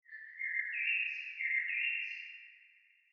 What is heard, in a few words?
europe birds; War Thunder